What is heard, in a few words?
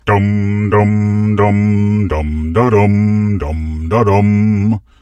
Sings; Vader